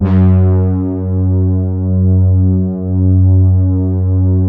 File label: OBERH, PADS; Vintage Voltage